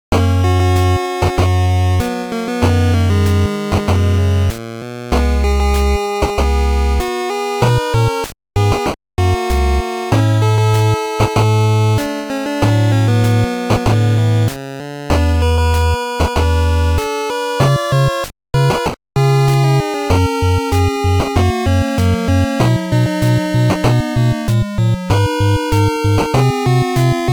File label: Good Ending; Turbo Racing; NES